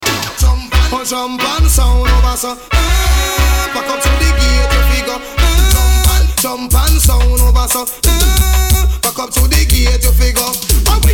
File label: Drum Pan Sound